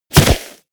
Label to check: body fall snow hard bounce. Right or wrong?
Right